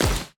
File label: shoot Cyclone; Mindustry